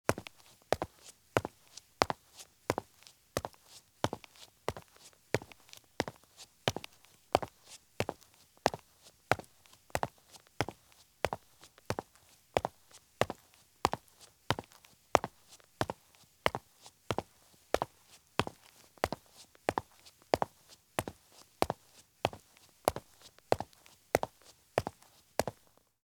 Medium Running Shoes Walk at Fast Pace on Concrete; Sound Ideas; Footstep Sound Effects